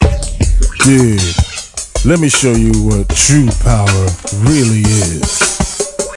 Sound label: Exiting Cool Mode